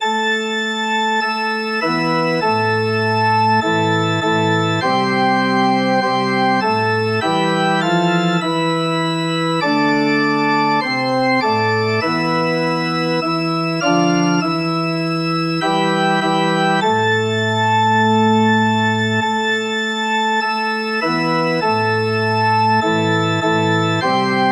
ORG, organ

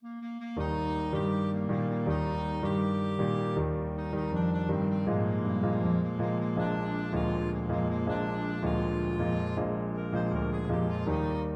la cucaracha, clarinet

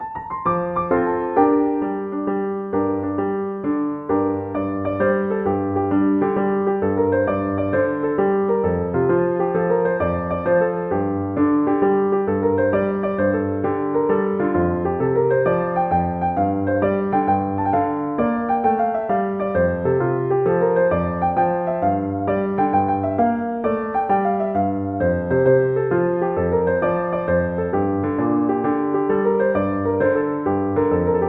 rocky road piano

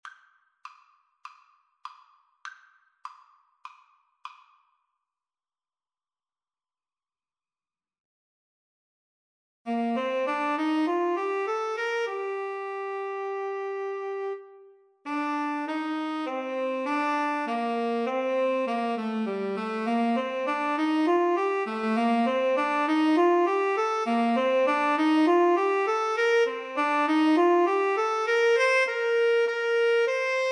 Tenor Sax Duet

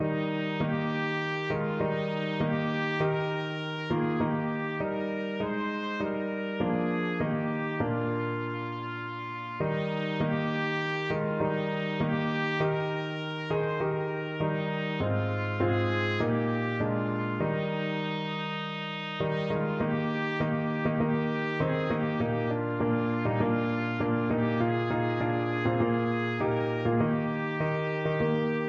at the cross trumpet

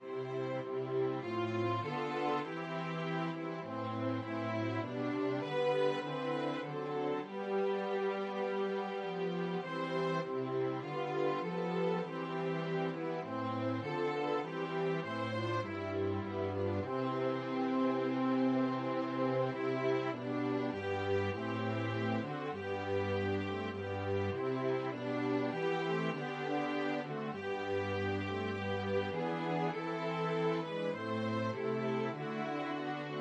STRINGS, string quartet; all things bright

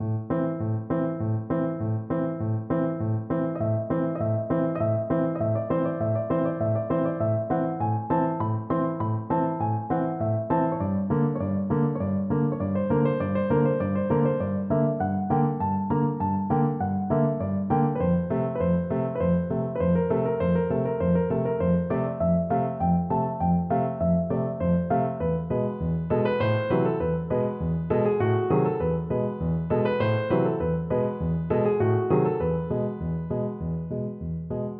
piano

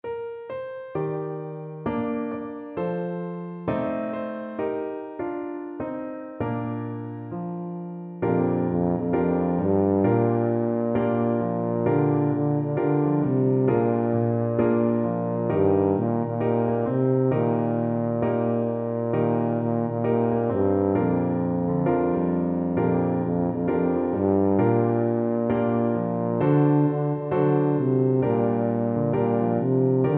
this little light, tuba

